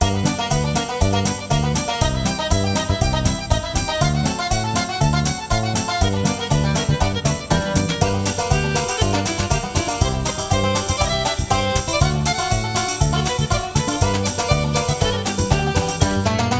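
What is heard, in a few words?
Bluegrass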